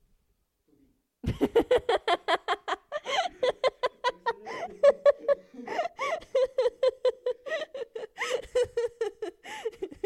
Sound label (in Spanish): risa amiga